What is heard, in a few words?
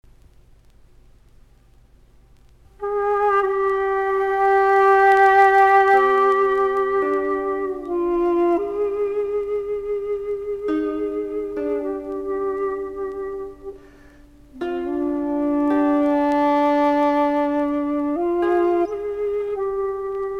Zen Meditation Music; Meditations